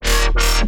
Wavetablize, bass; Unit

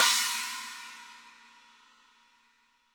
HiHat & Crash, Roland TD-20; HiHats

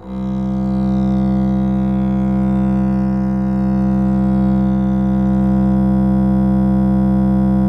DBL BASS, Synclavier Strings; ILIO

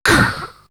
Beatbox; 90 sSampleCDs; Coldcut's Kleptomania VOL1

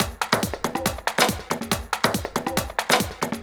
Addon Loop, Drum Loops 140bpm, Perc Loops

